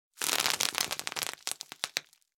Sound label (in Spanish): burbujas